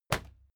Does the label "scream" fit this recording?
No